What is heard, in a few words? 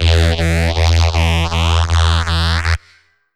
REESE ONE SHOT HIGH E 175